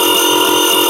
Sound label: ars bell; metrostroi